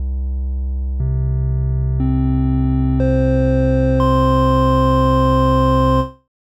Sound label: octave overtones